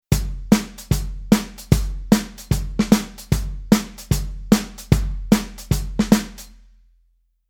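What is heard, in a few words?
Rhythm Country pattern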